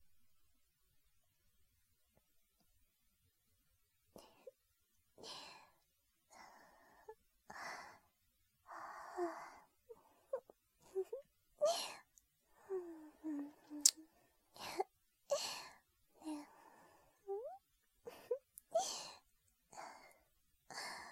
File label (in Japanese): キス; マゾハーレム; ミヨ様